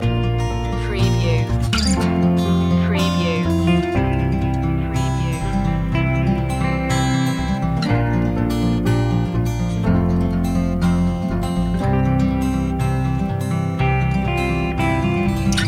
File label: my home town loop